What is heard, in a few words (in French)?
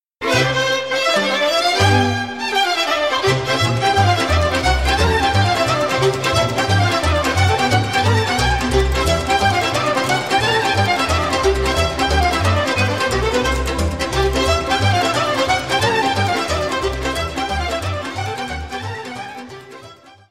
Sirba - Roumanie; Sirba de la Floroaia